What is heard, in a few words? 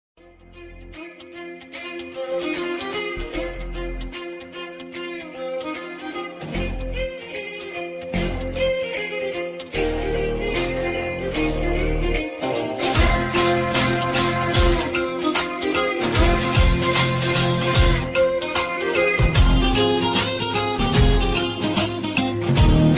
love bgm ringtone; sarvam